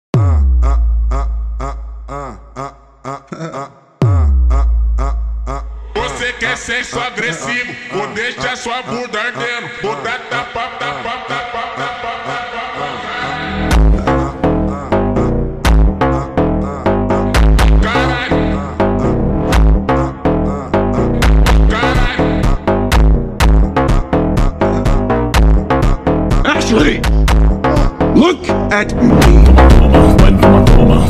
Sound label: montagem funk phonk